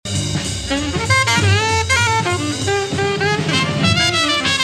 transition line

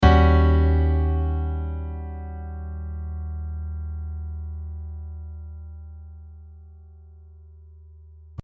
Eb7 Sharp Nine

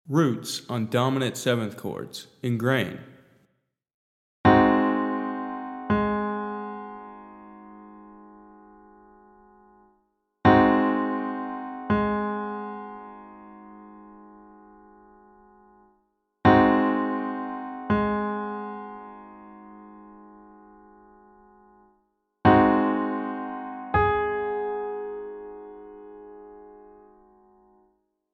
roots on dominant 7 chords

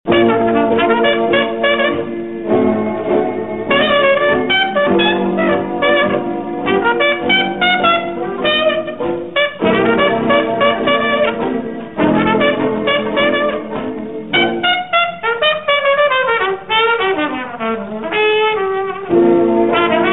blues solo

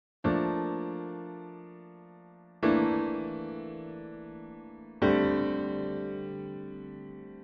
Piano Basics rootless voicing

